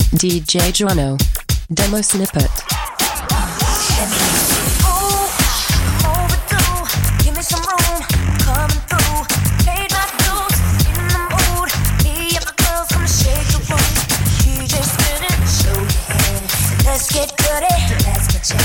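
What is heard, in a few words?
100bpm G minor; REDRUM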